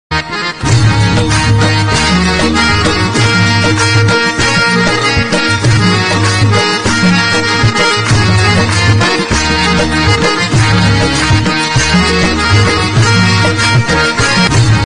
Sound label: instrumental love ringtone; srikrishna